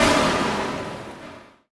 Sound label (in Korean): [Hit] 쾅; 音MAD; 少女终末旅行; 소녀 종말 여행 음원팩